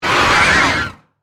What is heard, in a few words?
Monster Demon Horse, Horror, Monster, Demon, Horses, Scream; Monsters